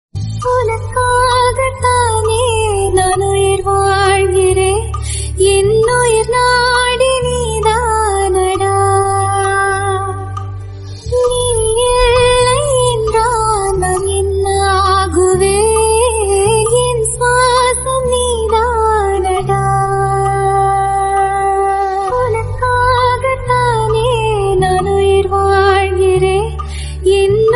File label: female version